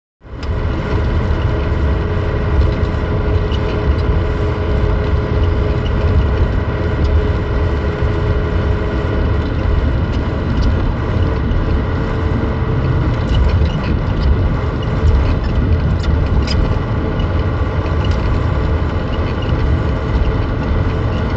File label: engine; CLANNAD FULL VOICE; Key